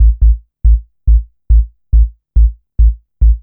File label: Synth Bass; Basses